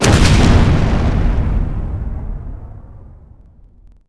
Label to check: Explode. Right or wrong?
Right